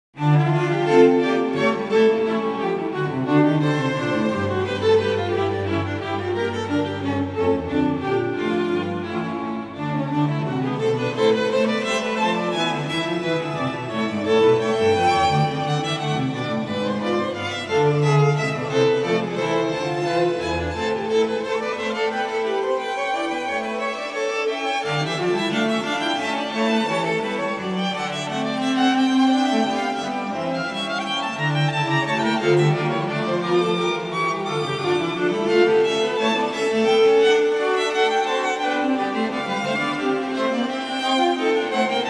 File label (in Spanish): Concierto 2 violines; J.S.Bach